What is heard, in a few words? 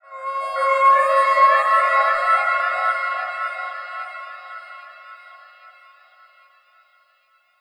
VIOLINS FX